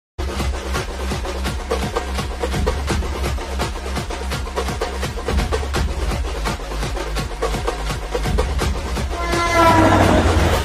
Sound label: arakkonam style bgm ringtone kuthu song bgm; Blue Star